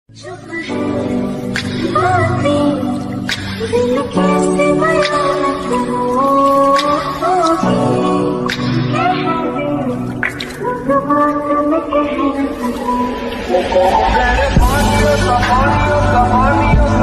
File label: Female Version; ringtones